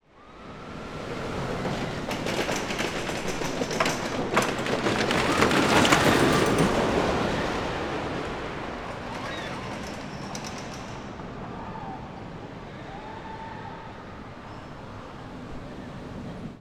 Rollercoaster Pass By, Wooden, Mechanism, UK Seaside Town & Theme Park; TRNMisc